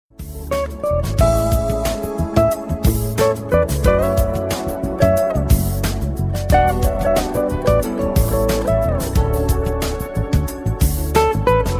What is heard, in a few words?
smooth jazz tone